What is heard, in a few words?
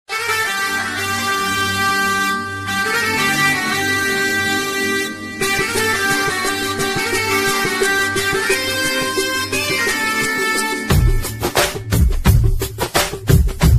kannada bgm ringtone; modala sala; yashu